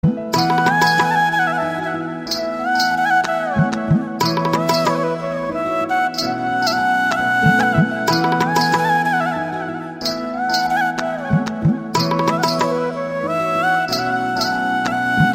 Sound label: Best Sad Flute Tone